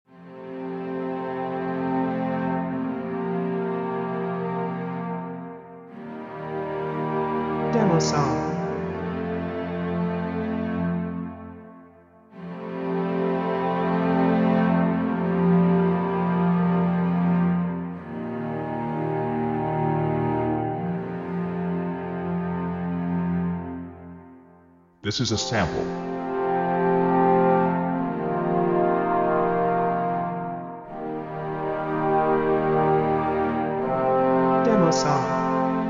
Peaceful Beauty

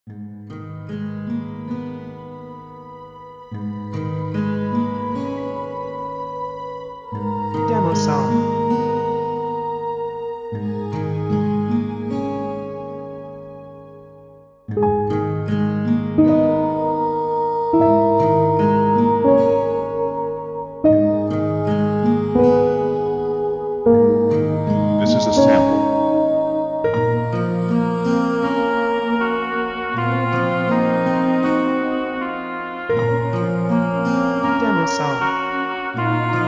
Walking Alone Solo Alto

